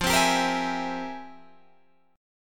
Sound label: F Minor Major 11th, Guitar, Drop D